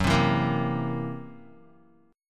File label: Gb 7th Flat 5th, Gb7b5 chord, Guitar, 4E,4A,5D,5G,5C,6F; strummed; JGuitar